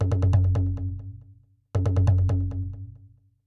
TOM ATMO, Techno 138 BPM; Best Service ProSamples vol.54; AKAI; FALLING HI

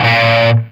SOLDANO ROCK, Guitars; Spectrasonics; Hans Zimmer Guitars VOL-1

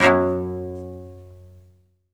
SOLO CE, Strings; Synclavier; ILIO

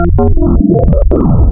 MICRO Q, wavetables, Classic Synths

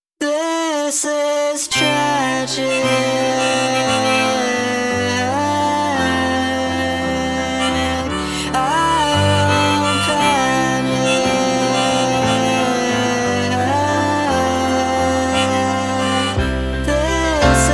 piano curse; random and out of place

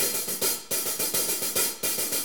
4-4 HiHat Loop

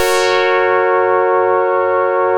BOLERO DR, Synth and Organ; InVision Interactive; Keith Emerson

